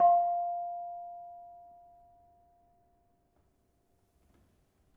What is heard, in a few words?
Bonang Barung soft, gamelan